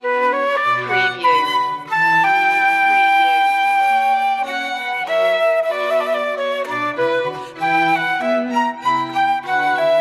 menuet, B minor, string quartet flute; J.S. Bach; BWV 1067

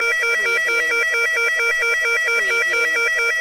Digital Alarm

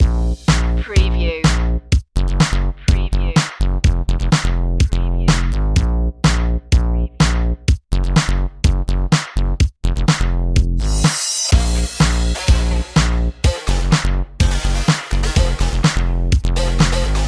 pulsating and uplifting loop

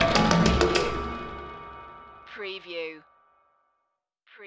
Musical

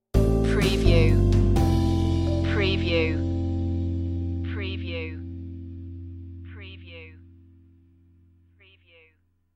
jazzy idents

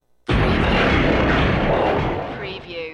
Explosion

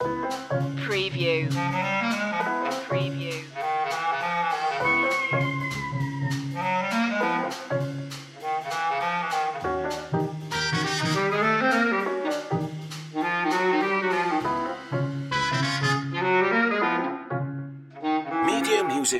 bugsy bop loop

